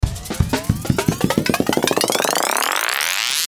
Ascend Salsa Groove; Blastwave

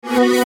Rollover Musical Reverse Short; Mouseover; Multimedia; Blastwave

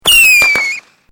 Fireworks Screamer Single, Domestic Fireworks

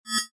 Rollover, Short; Mouseovers; Multimedia; Stuart Duffield

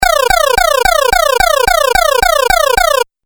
8 Bit Style Alarm Noise; Video Game Sound Effects

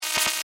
Glitch Digital; Sound Design; BlastWave

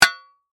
Impact Metal Shovel; Crashes; BlastWave

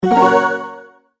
multimedia tone advance; McKinney Sound

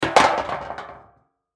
Impact Metal Trash Can Lid Drop; Sound Effects:Materials; Blastwave

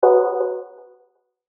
button burst airy; Multimedia; Buttons

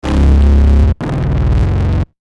Descend Low Distortion Pulses; Descending Sound Effects; Blastwave

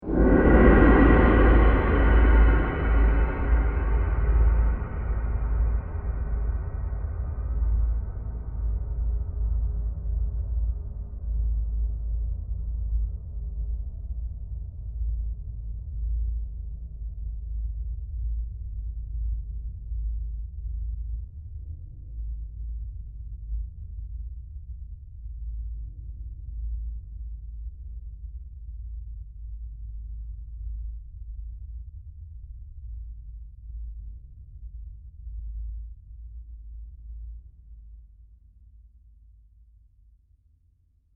Gong Musical Long Low Rumble; Trailer; Hits

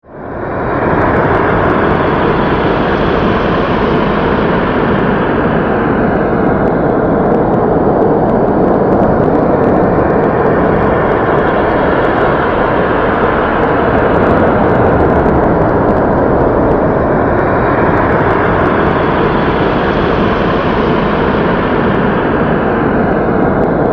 Monster Lair, Horror; Monster, Lair, Horror, 5.1; Sound Effects:Science Fiction; BlastWave

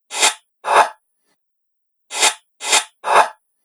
Sharp Chopper Loop, machinery

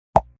Knock; Knocks

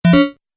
Multimedia Lo-Fi Event Identification, beep; Business Programs, Apps And Computin; Andrew Fleming

